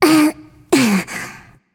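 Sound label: nonverbal grunt lift; smite; voicepack; izanami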